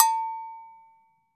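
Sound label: agogo bell low, GM drum set; AKAI S-Series CD-ROM Sound Library VOL-8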